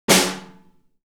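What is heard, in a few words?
ROOM SNARE, drum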